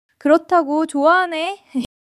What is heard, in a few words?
laughter